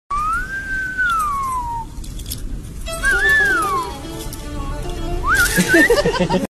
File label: whistling; pushpa raj; audio meme